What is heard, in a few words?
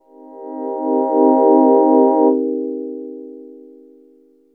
voice, dx7